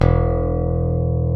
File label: Basses, Roland